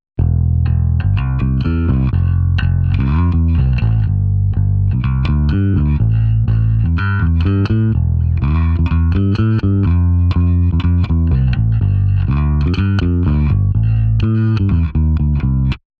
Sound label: bass wet